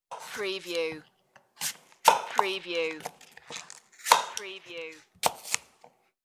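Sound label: Cutting Crisp Produce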